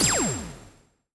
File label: ice beam high